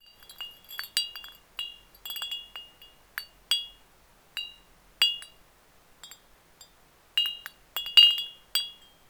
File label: ceramic chimes; WAR